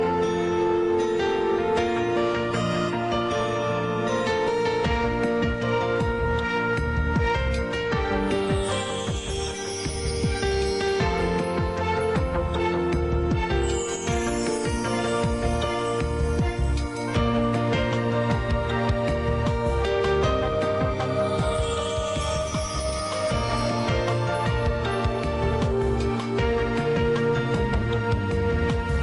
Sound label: Instrument